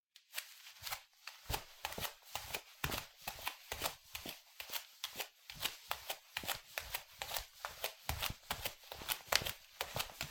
フローリングを裸足で歩く

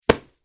スマホをシーツに投げる音

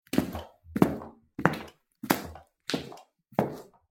コンクリートの床を歩く音; concrete

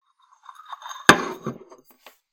テーブルにティーカップを置く音

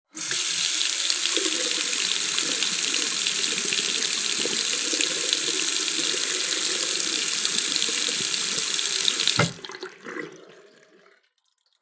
洗面台の蛇口の音

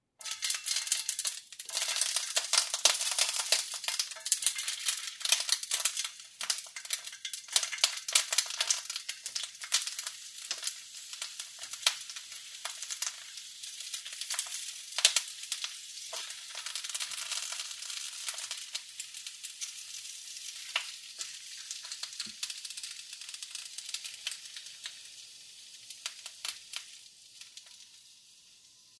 炒める音

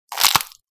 骨が折れる音

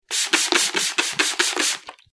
霧吹きの音 激しめ

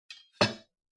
包丁をまな板の上に置く音

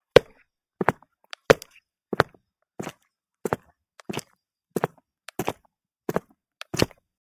スニーカーで歩く音; sneaker

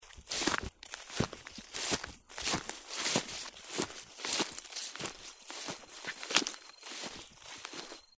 スニーカーで草の上を歩く音; sneaker

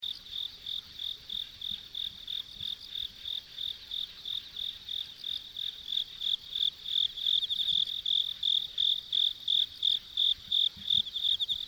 森の虫の鳴き声の音

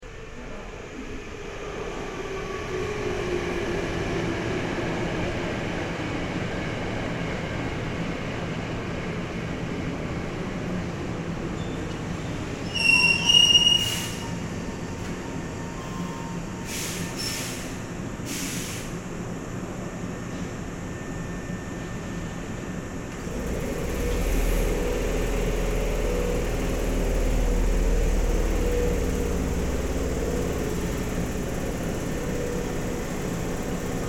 駅ホームに電車が到着して発車する環境音

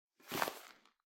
ゴム手袋を取る音

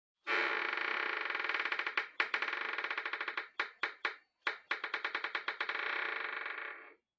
ドアがきしむ音; door

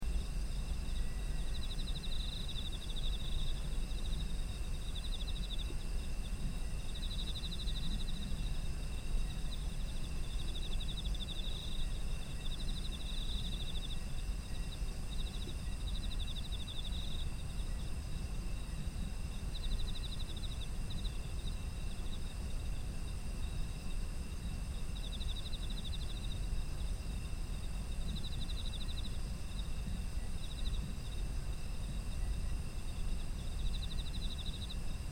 秋の虫の環境音

